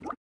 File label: running water, river sounds